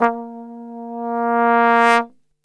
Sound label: A#1, Brass; Best Service; Brass Super Section